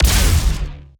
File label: Lasrgun Ballista Fire Long